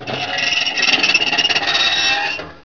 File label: scraper